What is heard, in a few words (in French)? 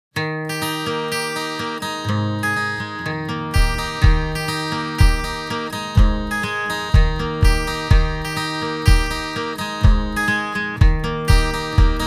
comptine music; jean petit qui danse